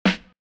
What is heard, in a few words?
Snare INTRO